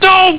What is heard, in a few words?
doh; Viper